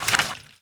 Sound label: Gun Draw Select Weapon, Weapons Foley; SFX Kit